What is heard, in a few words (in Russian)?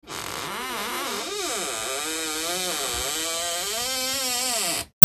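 kalitka otkryvaetsya, gate opening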